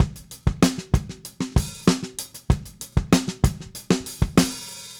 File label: Drums, 70s Analog Funk